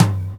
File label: TOM TOM; E-Lab; X Static Goldmine 2; akai